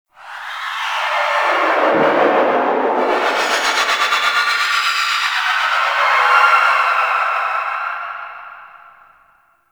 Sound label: Undercroft Shriek Tonal Verb One Off, Ambience; Warframe; Duviri